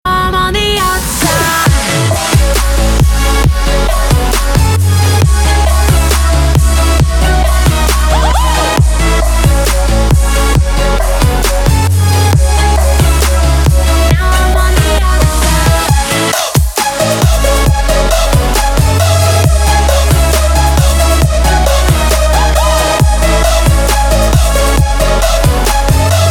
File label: trap remix